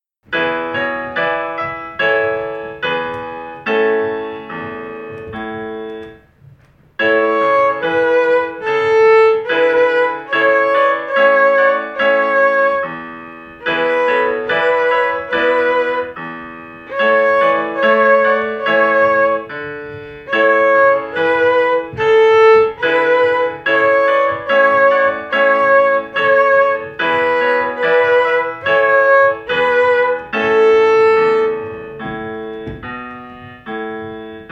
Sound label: mary had a little lamb on A with violin